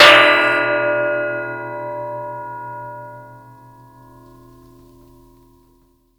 SLAM Water Pipe Long Stick Ringout

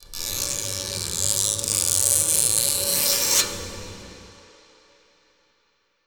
Scratch; Scratch Me

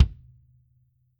Kick In

